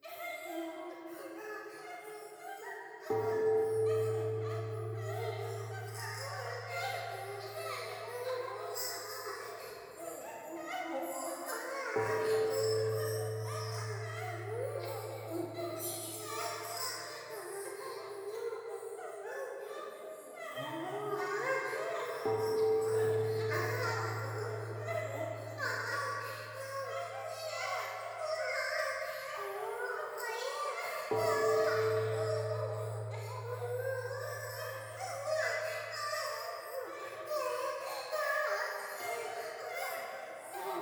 風呂 喘ぎ 神社

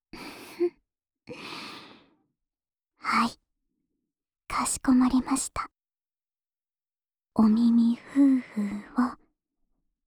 強めの耳吹き／右

